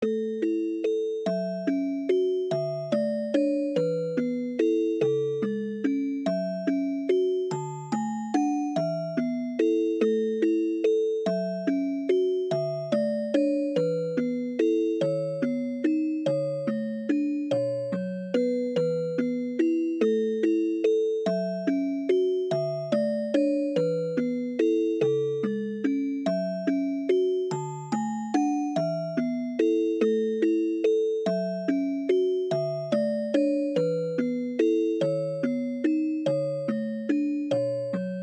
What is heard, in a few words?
lake, bgm